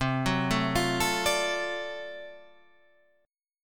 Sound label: D 7th Sharp 9th C, chord